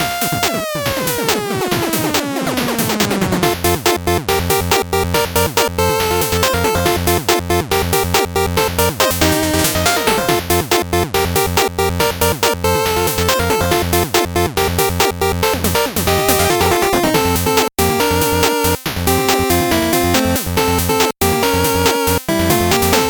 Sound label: 8bit bgm normal